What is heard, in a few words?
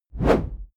whoosh noise sweep hit low short